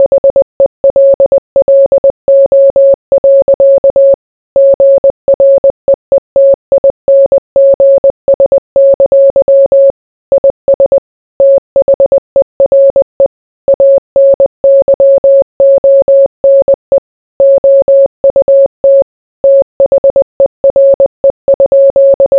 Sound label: morse